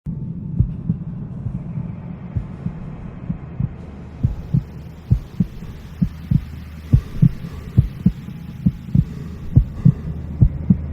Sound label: heart, ambience